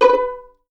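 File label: B4 pizzicato forte left, violins; East West; The Ultimate String Collection; VLNS PIZZ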